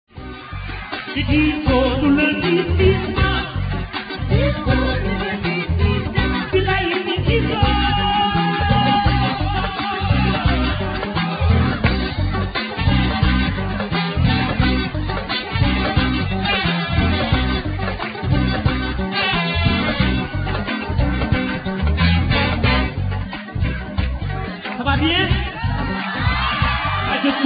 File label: diapason